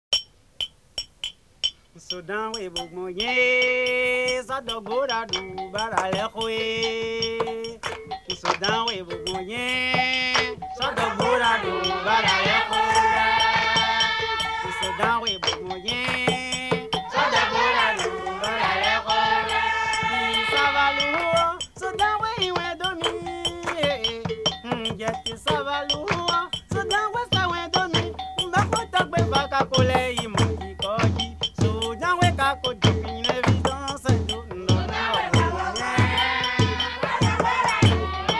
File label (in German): Togo 12er Glocke; Minimal music